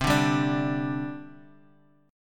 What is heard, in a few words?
C Suspended 2nd, C, down, chord; Csus2 strummed; JGuitar